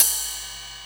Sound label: Open Hihat; Vengeance Samples; VEE2 Cymbals